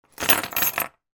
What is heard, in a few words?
household big bunch of keys put down on table